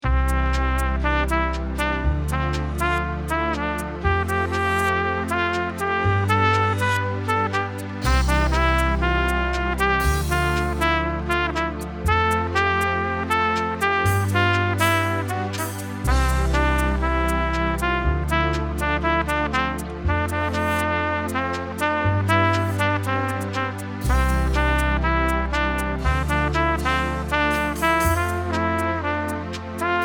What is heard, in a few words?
melody techno